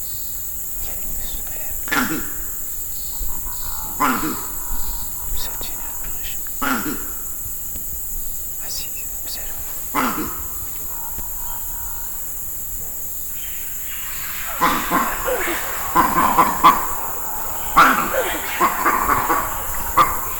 Eagle attack, campbell, vocalisations; compcog

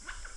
diana vocalisations

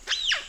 diana vocalisations

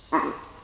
hok-oo, campbell; vocalisations; compcog

